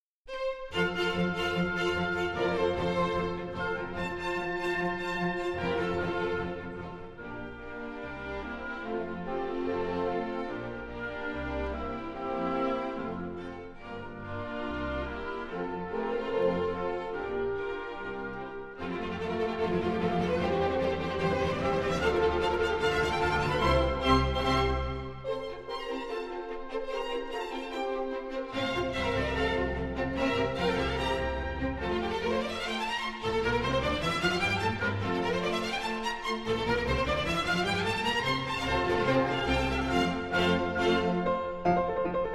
Concertos for Piano Concerto in F; Mozart; Sondeckis

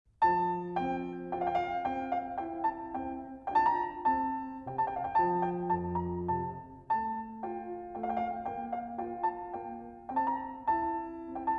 Suite VIII in F major Minuet; Purcell

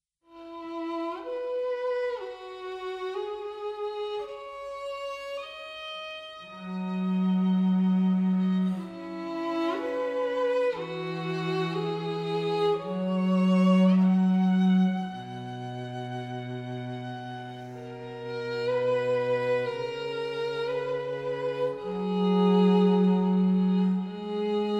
String quartett, Adagio semplice

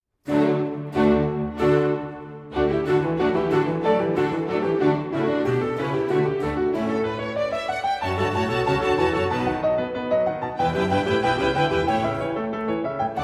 Solo Concertos, Keyboard; Bach; Sondeckis; Musical Heritage Society; J.S.Bach Keyboard Concerto in D Major (BWV 1054)

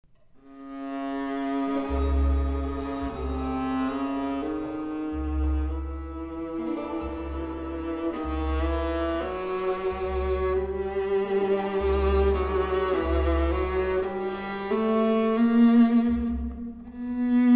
Concerto for viola; Barkauskas

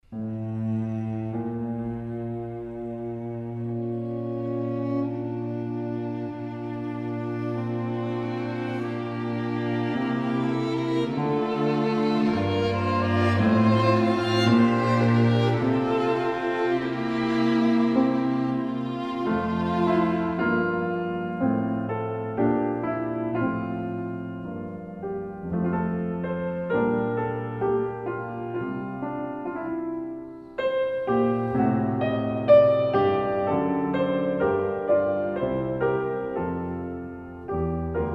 Piano Quintet; Reinecke

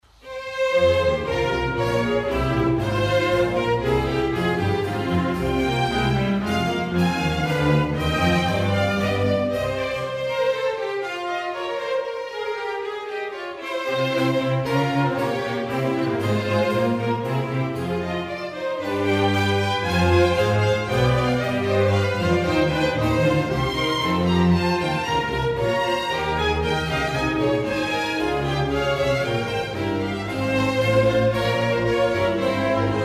Oboe Concerto in F minor; Telemann

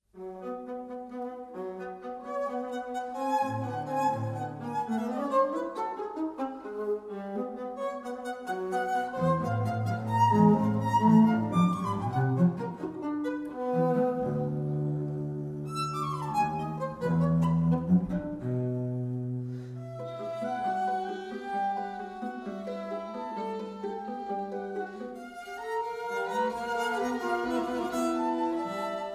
String quartett, Allegretto

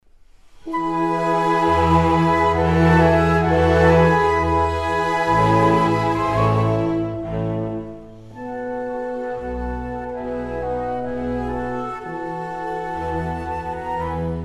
Adagio in E for Violin and Orchestra; Henkel

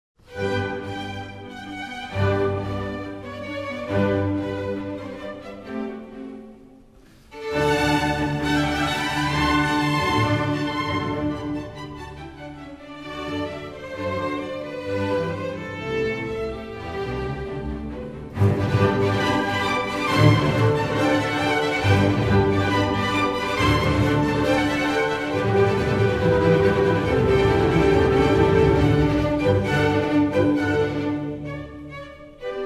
Violin Concerto; Mozart

